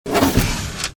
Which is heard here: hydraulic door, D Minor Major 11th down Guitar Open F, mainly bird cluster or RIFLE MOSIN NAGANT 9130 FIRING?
hydraulic door